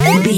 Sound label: retro collect pickup item